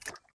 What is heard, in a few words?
fs mud, footsteps